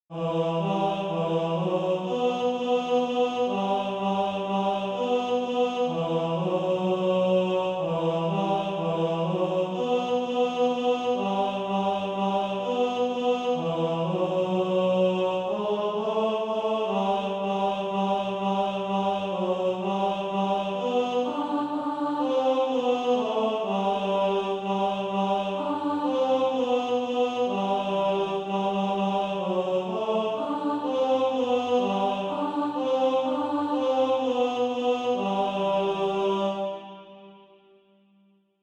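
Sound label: tenor, SATB Practise Music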